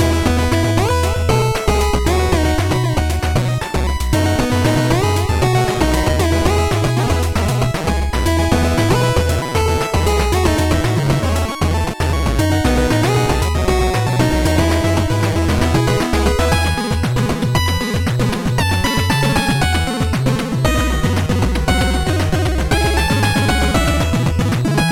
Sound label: 8Bit; DPPt